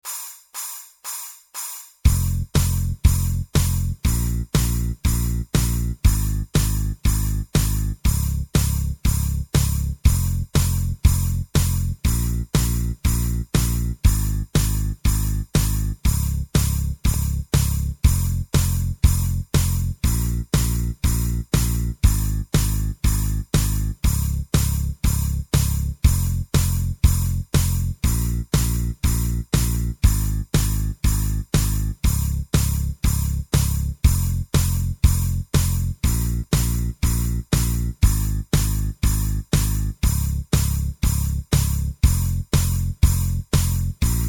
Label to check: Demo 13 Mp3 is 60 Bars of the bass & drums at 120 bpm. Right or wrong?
Right